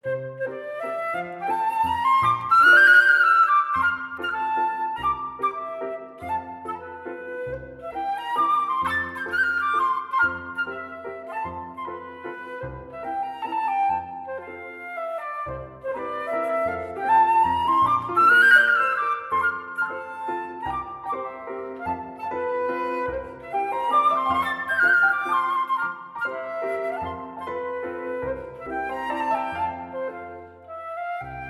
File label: mazurka; chopin; op 7 no 1